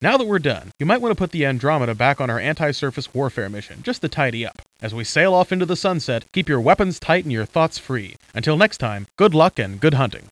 HARP

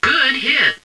good hit; THEXDER

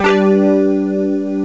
G MAJ STRU

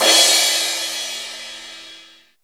CRASH, DRUMS; CYMBALS

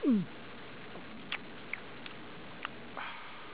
GULP

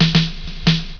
DINKER

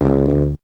SAX SCOOP

